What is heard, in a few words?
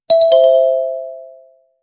subscribe chime